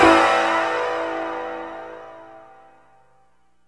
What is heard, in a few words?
indian hit (A); Sonic Foundry (Sony Creative Software); Ugly Remnants VOL-2